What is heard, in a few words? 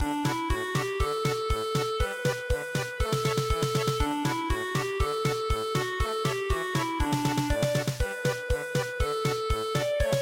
Cow has run away German Polka